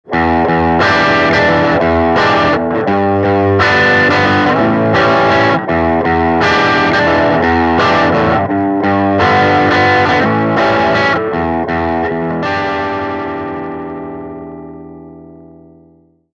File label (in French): les paul voicing 10; fender super champ x